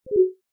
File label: message contact out; stereo; kde; ocean